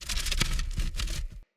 Stone Move Large Start; Puzzles

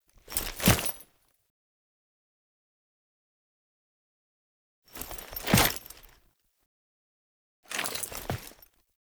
Idle Noble Pistol; Warframe; Dagath

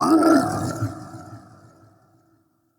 Cannonball Slam Vocal; Powers; Bromius

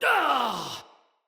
Pain, Soldier Male; Battle; Barks; Dialog; Dendrit

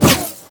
Spin Whoosh, Weapons; Warframe; Dual Zoren Prime

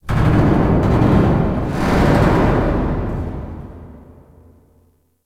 Warehouse Metal Random, ambience; Warframe; Guild

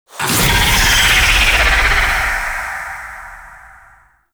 Vocal Shriek; Enemies; Warframe; TheNewWar; Archon; Nira

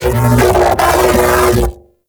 Mech Ambient Vocal; Warframe; Vehicles; Thano Mech

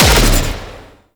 Air Pistol Projectile Explosion; Crp Air Pistol

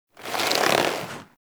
SFX Foley Hand; Parvos Lich Intro; Cinematics

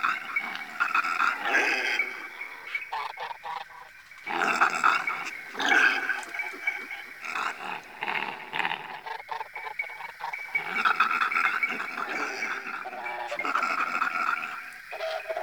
Infested Eris Exterior Critter Lake Loop

